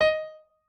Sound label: piano